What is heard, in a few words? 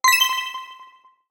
Ui Confirmation Alert